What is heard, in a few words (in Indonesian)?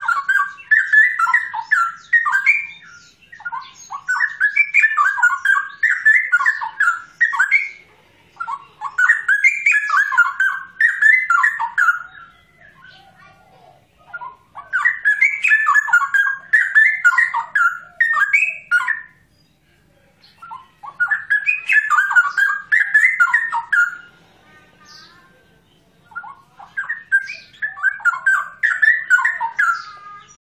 suara maskot